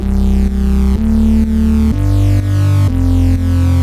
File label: Bass